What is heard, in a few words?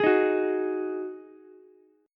D sharp Minor Double Flat 5th D sharp, down, Ukulele; strummed; chord